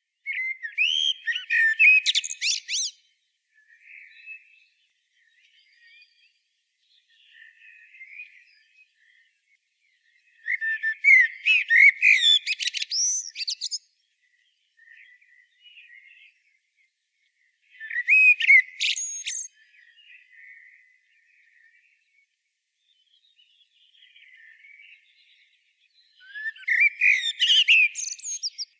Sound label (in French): chant merle noir; oiseaux